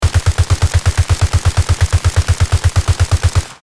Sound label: HK416 assault rifle full auto fire